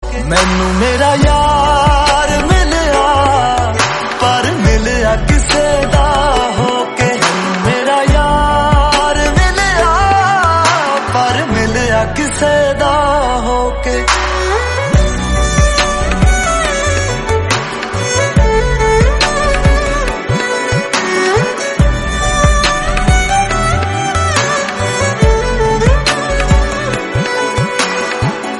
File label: punjabi song